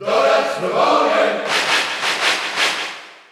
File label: Cheer Dutch; Villager; SSBU